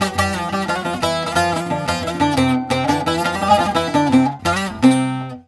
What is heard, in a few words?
175 SAZ C+1; PH SAZ